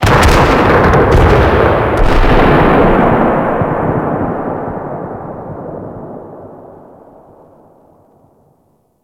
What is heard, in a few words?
Colonist Ship Explosion; Ambience; Warframe; Grineer Galleon